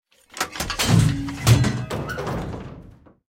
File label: howitzer shot mech; cannons; War Thunder